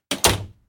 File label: door close; Golden Crayon Sounds